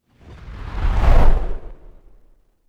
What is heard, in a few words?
Whoosh Heavy Passing Fire Explosion Sweetener; Hollywood Edge; sound designer's toolkit v2